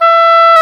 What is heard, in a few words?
oboe dry; Roland L-CDX-03 Disk 1; Oboe 4-8